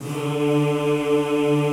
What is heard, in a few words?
D2-R, Classical Choir; Best Service; Extended Classical Choir